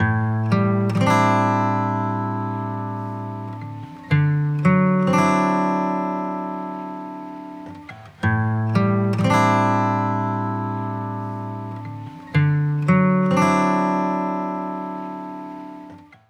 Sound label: Guitar